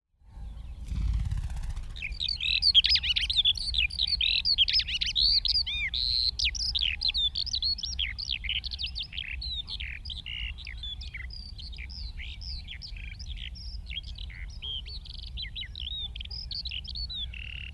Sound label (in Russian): polevoy zhavoronok alauda arvensis, zvuki peniya ptits